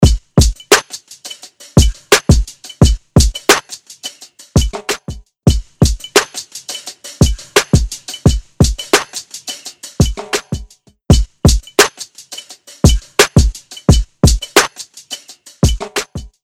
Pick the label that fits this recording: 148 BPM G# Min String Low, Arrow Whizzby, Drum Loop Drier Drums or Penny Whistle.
Drum Loop Drier Drums